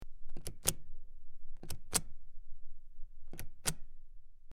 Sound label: Button Press In Out, switch clicks